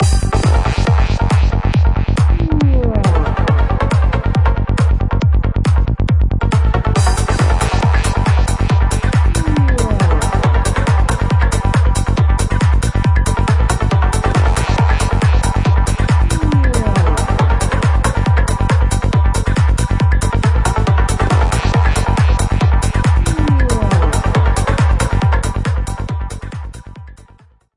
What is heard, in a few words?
PROG PSY KITS